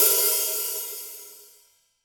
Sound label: ROCK KIT, Real Drum Kit